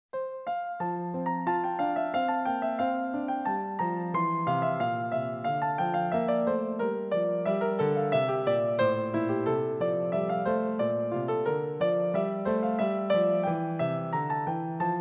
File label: F Major